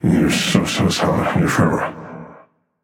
grumble; smite; voicepack; thanatos

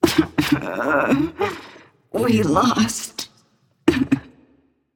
boo hoo; smite; jingwei

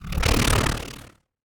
wing; Smite; god Khepri

